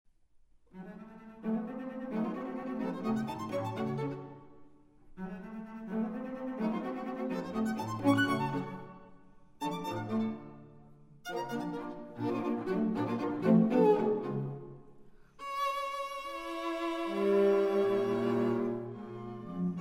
Quartet for Strings in D major; Franck

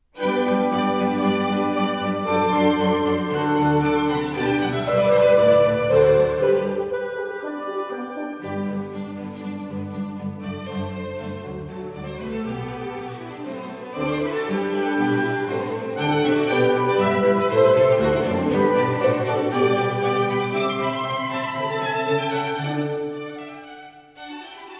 Flute Concerto No. 1 Allegro; Mozart

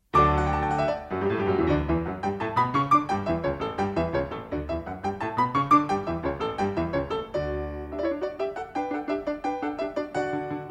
Sonata D-dur; Mozart; KV 381

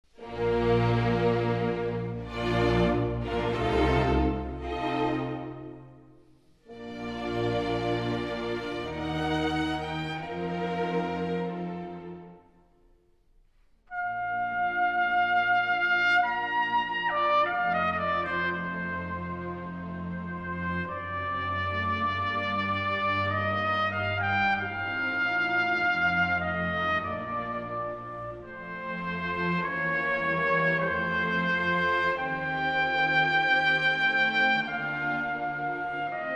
Weber Concerto for bassoon; Sondeckis

